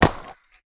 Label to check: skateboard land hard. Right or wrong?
Right